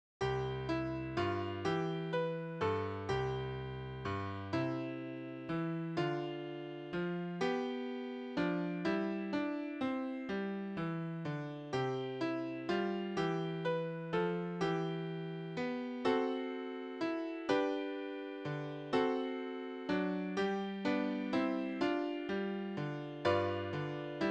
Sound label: easy piano; Tell Me the Stories of Jesus